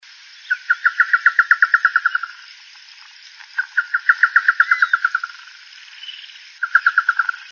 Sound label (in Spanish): Chotoy; Ceibas